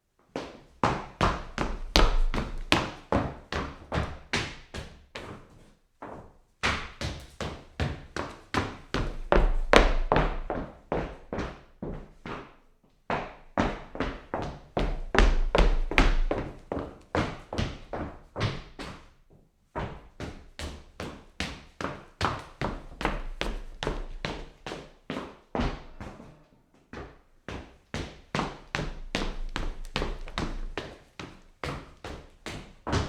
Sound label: hard sole shoes on wood floor